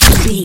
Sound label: gun silenced rifle 3 shot